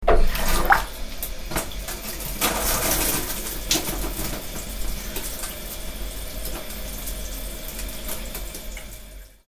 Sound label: shower; easyrpg; WitchsHeart